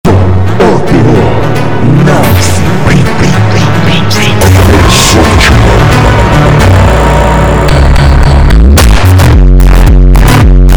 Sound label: Montagem, bassboost